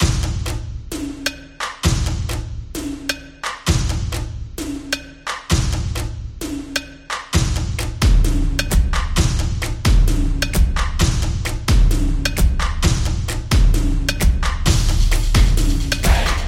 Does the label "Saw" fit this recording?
No